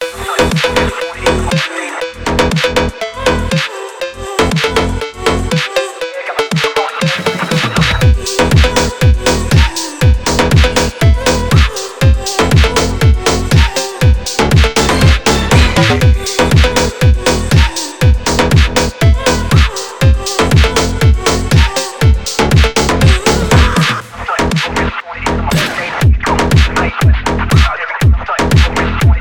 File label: phonk drift